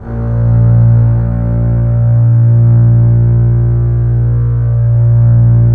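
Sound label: Bass Legato A#1; Multisamples; Digital Sound Factory Virtuoso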